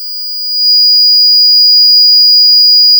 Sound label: pop, sound of a tea kettle whistling